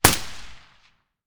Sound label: ump45 silencer mono distant